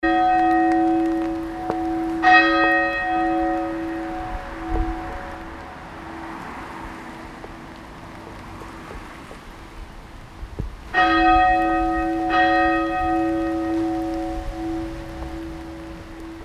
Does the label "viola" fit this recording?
No